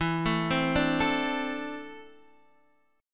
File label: E 7th Suspended 4th Sharp 5th, Guitar, DADGAD